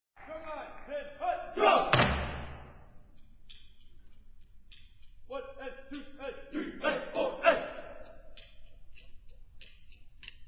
drums; WVU

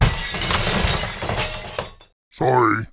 Crash Glass; Warcraft

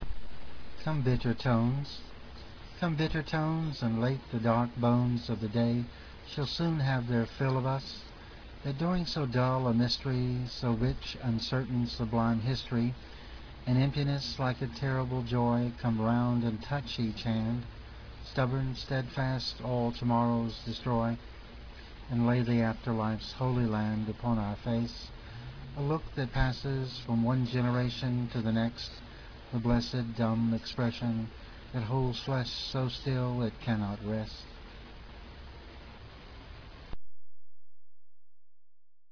tones

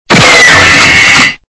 rocket launcher

